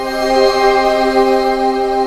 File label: EMU MELLOTRN; Rubber Chicken Software Sample Bank